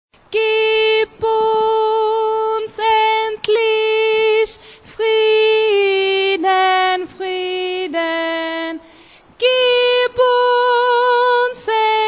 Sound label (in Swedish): sopran 2